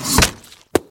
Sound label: bone break behead